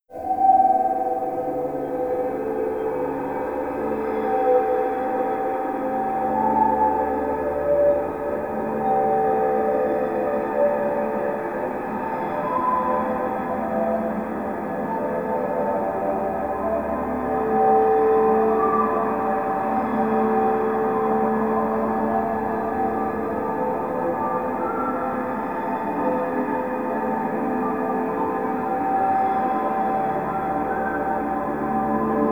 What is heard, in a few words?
Snowy Mountains Ambience; Monster Hunter 2 Dos complete soundtrack; Area 3, 4